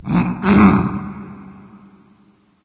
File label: nv clear throat; smite; awilix